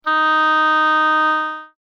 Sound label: oboe